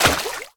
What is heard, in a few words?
wall water; Golden Crayon Sounds